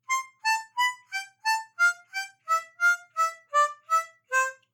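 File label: C Major Couplets Staccato, harmonica; The animals went in two by two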